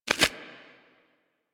Load Arrow, Weapons; Warframe; Infested; Inf Cernos

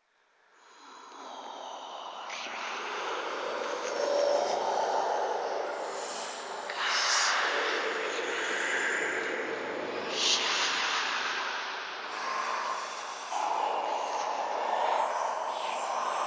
Whispers; Warframe; Ambience; Entrati

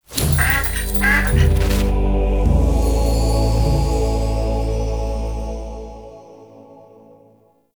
Duck Mote Acquired; Animal Motes

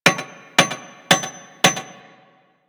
Village Hammering One Off E; Warframe; Ambience; Eidolon Plains; Ostron Village

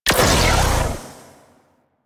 Med Charged Shot A; Warframe; Weapons; Corpus; Vandal

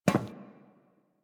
NPC Step Default, Footsteps

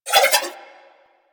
Nunchaku Spin Whoosh; Weapons; Warframe; Tenno

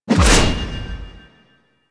Melee Heavy Attack Whoosh; Warframe; Weapons; Whooshes; Heavy Attacks

